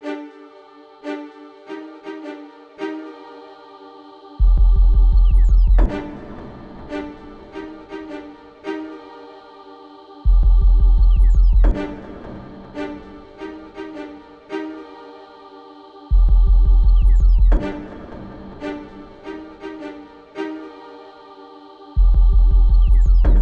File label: Short Trap Loop